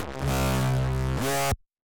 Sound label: Screech, Synths; BlackBook